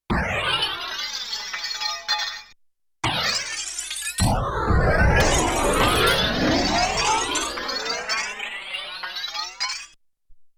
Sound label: Broken Glass up; Yamaha TX16W; Sound FX